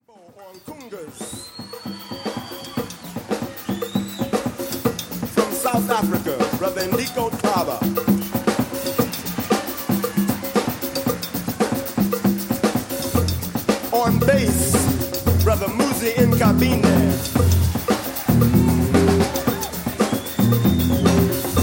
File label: african rhythms